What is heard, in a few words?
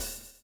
swing